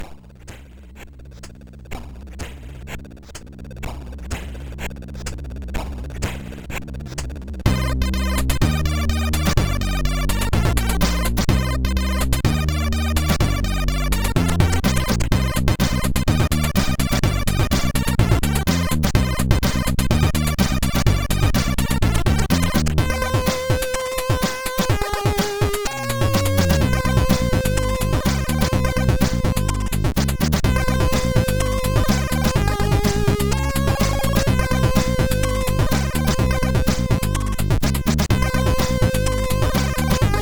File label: Digital Stomp, sid MOS6581R4